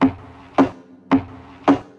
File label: axe; Mafie 1